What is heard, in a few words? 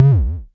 TOM, Klone Dual-Percussion-Synthesiser